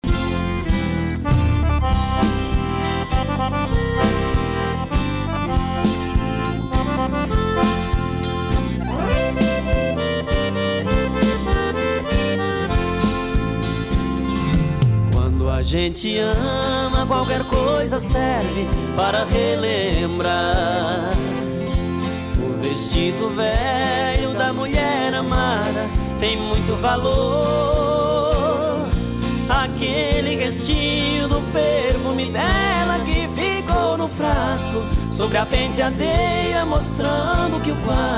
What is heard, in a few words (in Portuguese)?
sertanejo universitário; o melhor do sertanejo universitario